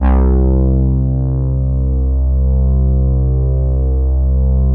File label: MEMORYMOOG